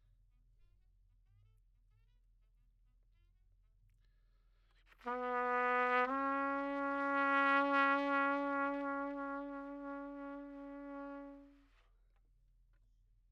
Trumpet

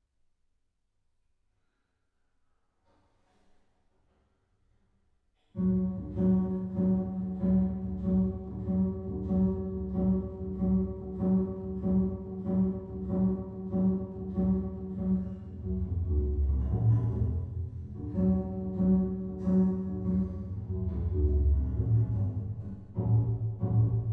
Bass

